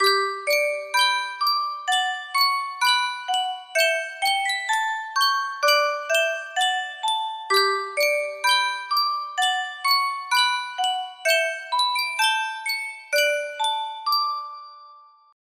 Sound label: Sankyo music box